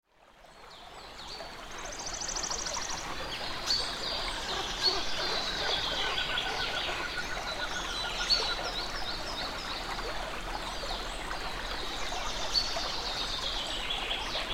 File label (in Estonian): Linnuhommik Altja jõe ääres